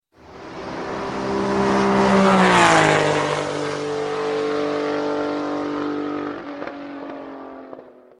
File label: Fast Sms